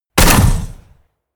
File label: shot